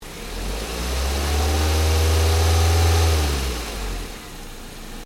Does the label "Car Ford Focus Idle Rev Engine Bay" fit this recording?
Yes